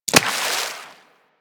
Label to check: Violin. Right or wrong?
Wrong